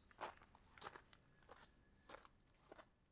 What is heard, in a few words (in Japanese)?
砂利道 歩く; 砂利道を歩く; 往復; 動き